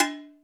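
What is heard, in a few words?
Layer Bell, Drum Machines Alesis D4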